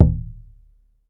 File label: PUEBLO DR, American Natives